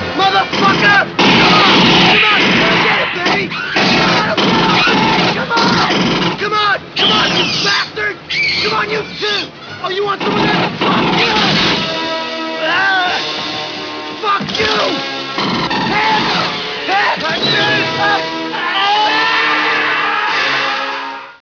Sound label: battle; aliens; с ксеноморфами